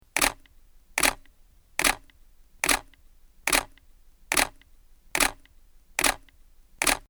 Canon EOS 1D Mark IV

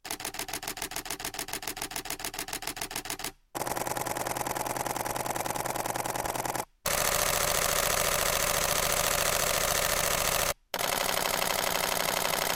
Canon EOS 80D 7DII 1DXII 1DX Burst

